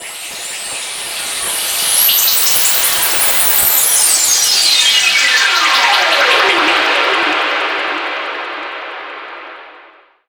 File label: TECHNO FX 140